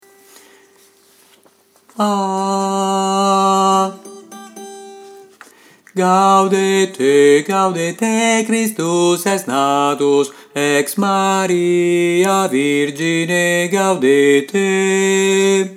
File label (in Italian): bassi